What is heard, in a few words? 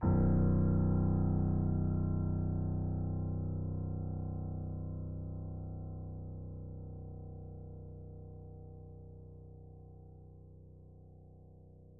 Horror Piano Note